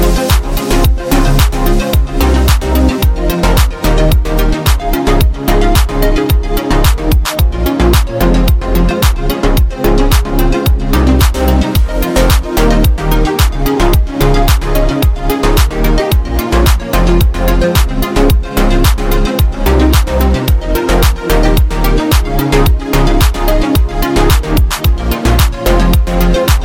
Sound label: Huawei Ringtone; Huawei Nova 3i